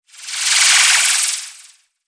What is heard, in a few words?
Hip Sways; Evolve; Wraith